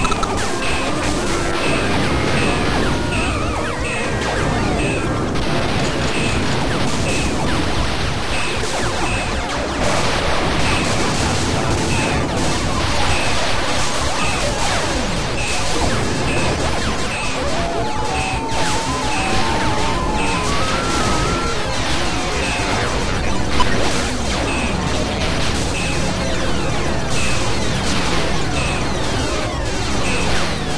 Arcade; Android

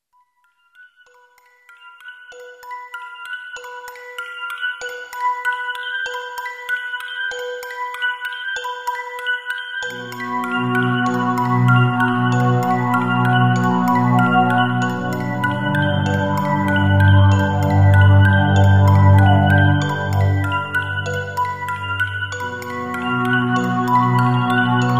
Exploration; Shiro to Kuro no Densetsu Shin Hyakki Hen Original Soundtracks 2008

